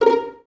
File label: Violins A#4 Pizz, String Ensembles; Miroslav Vitous; 11 Violins